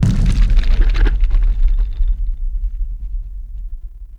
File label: Large Cave Quake; Ambience; Warframe; Duviri; Murmur